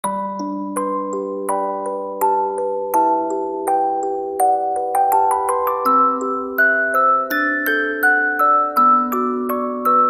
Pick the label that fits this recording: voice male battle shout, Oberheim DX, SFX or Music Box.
Music Box